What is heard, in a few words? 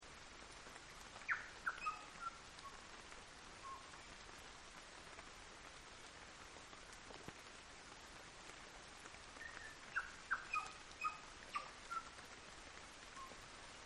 Rainforest; Nature Scenes